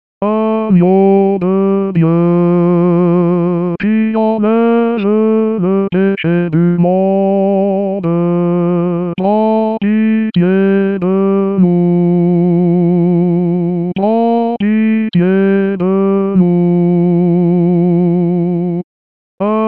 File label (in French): Agnus Messe Réconciliation